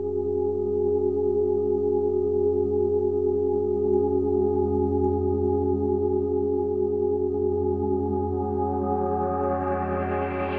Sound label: keys